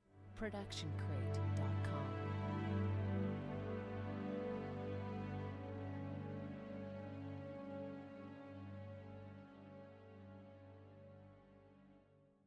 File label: feel good strings phrase; soundscrate